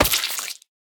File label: banana hit; smite; raijin